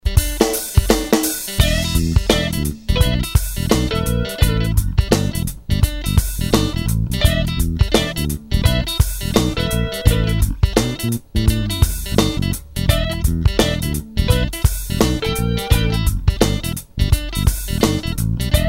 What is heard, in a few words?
funk; bassist